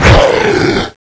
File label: king zombie attack; incredi pack horror